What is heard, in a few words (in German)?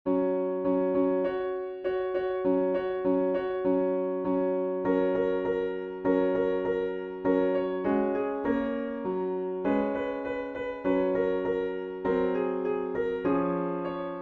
Jingle Bells Solo Klavier